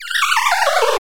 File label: 8 Bit Spooky